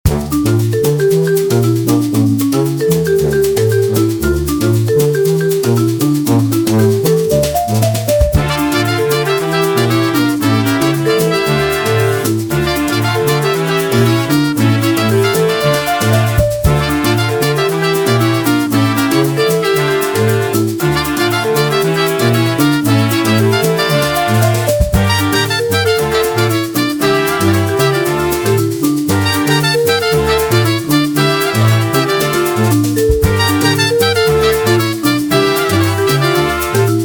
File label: Rumba